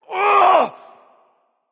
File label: ouch; smite; thor